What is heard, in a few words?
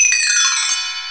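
Triangle, Roland S50 Percussion; Drum Samples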